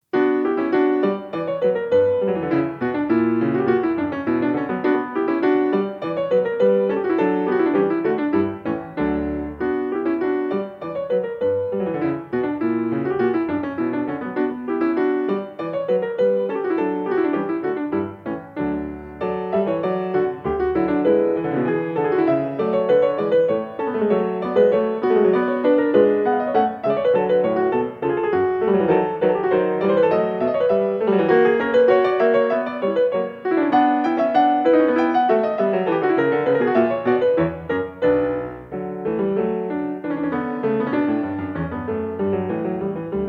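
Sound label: Overture in the French Style - Gavotte, piano; Bach; BWV831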